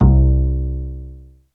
AC BASS, BRUSH KITS; Crypton - DrumBase5000

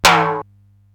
SDS9 RM, Simmons SDS-9, Rimshots

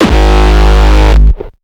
Kick

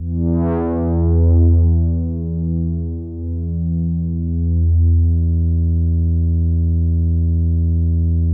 OBERHEIMS

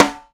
Total Rim, Dimensional Snare; Airwindows

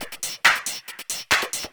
percussion loop 138, techno; PS-45

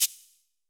cabasa; Greytsounds; Scott Peers Sound Engineering

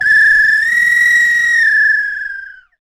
PRC Bird Calls; NorthStar - Global Instruments VOL-2

